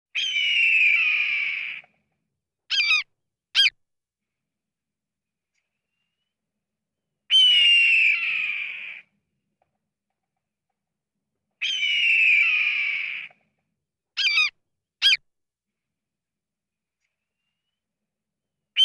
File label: Bird, Hawk Red Tailed Hawk Callin